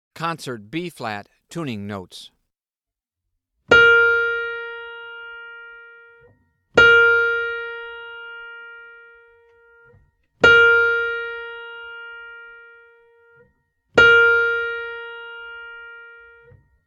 Tuning Notes; James „Jamey“ Aebersold Play-Alongs

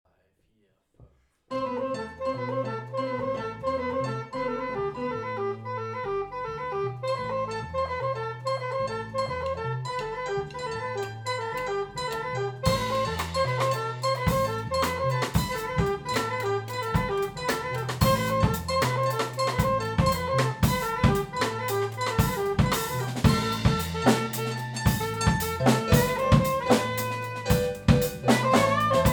Solo; Probe; Zwei Kämpfer (synchron, souverän, aggressiv)

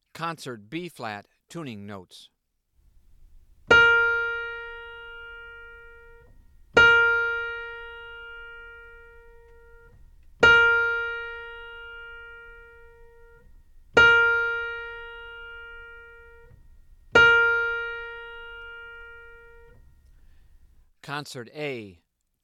Tuning Notes; James „Jamey“ Aebersold Play-Alongs

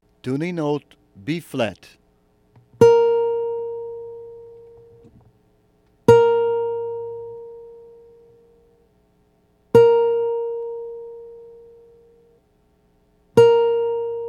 Tuning Notes; James „Jamey“ Aebersold Play-Alongs

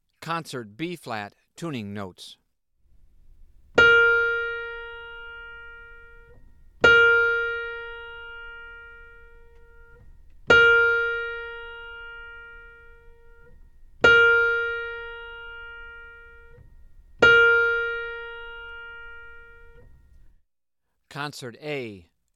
Tuning Notes; James „Jamey“ Aebersold Play-Alongs

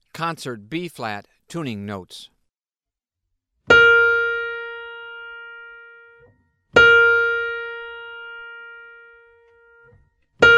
Tuning Notes; Getting' It Together – Learn To Improvise Like The Jazz Masters!

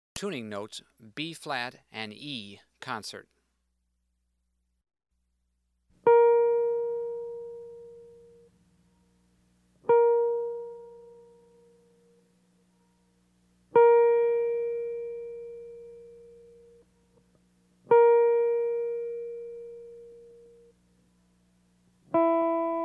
Tuning Notes; James „Jamey“ Aebersold Play-Alongs

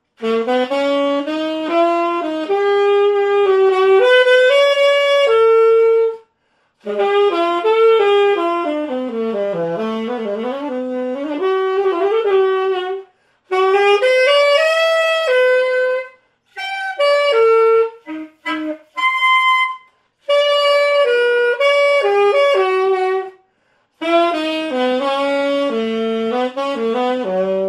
Jody Jazz, Alto Sax; Nils Thoma Test Alto Sax-Mundstücke (Castle, Saarburg)

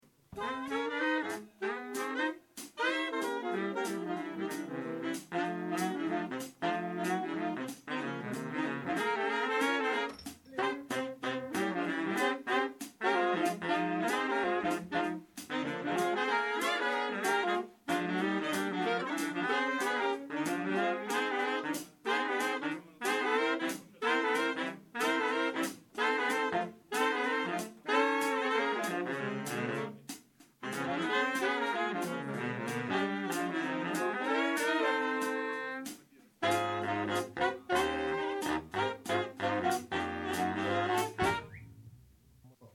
Saxophone; Probe

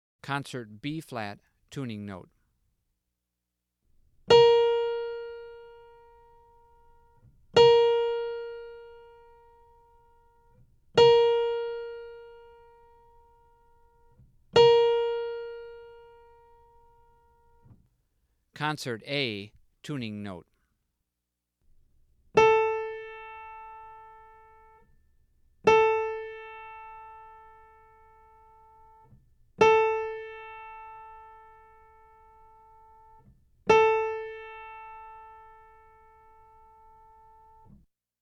Tuning Notes

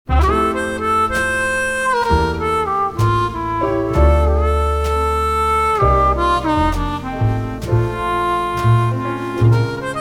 Mellowbird Blues, Jazz Blues Bossa Nova

